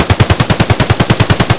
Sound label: ak102 outdoor distant loop; Escape from Tarkov